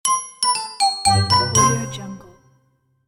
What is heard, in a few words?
cartoon cute musical ending